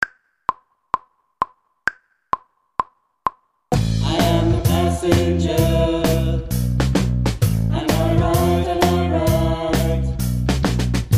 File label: vocals